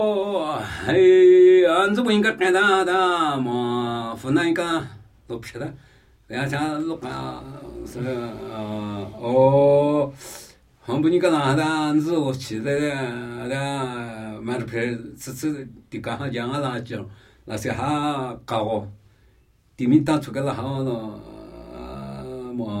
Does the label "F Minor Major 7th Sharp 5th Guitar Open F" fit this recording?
No